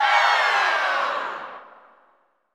CHO FALL, CHO Falls; Sound & Vision - Gigapack I CD 2 (Roland)

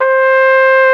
Flugelhorn; Roland L-CDX-03 Disk 2

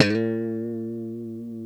FUNK SLD, STRAT; Spectrasonics; Hans Zimmer Guitars VOL-1

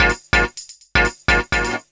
lop 126bpm, House Instr and Stab Loops

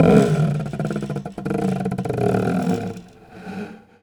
Prepared Drums

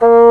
A#2, REED; AKAI S-Series CD-ROM Sound Library VOL-8

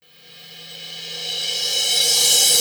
Reverse Cym, Cymbals

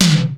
MD TOM; Hollywood Edge; The Big Bad Beatmachine; XR-10

